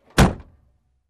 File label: Closing Trunk